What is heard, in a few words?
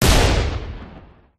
hammerturret firestop enemy; gow